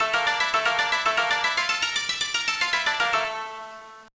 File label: Jingle; Ojarumaru: Gekkou Machi Sanpo de Ojaru; GBA